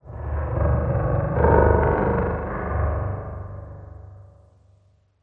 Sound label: amb gen low metallic, ambient; Half Life Model Pack